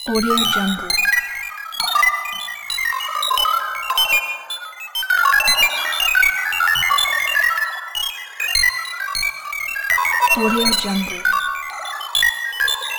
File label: data download